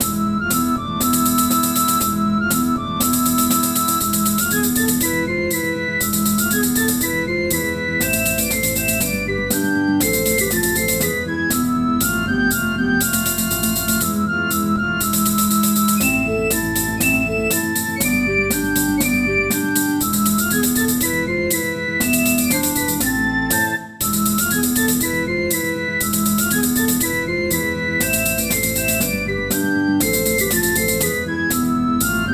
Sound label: Greek Song and Dance; MTWFC VOL1 Audio Accompaniments